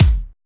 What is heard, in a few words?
thick kick; Drums In Your FACE!; NEWJACK